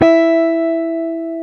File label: GTR, Jazz Guitar; 90's Sample CDs; Club-50 - Foundations Roland; Jaz-Kessel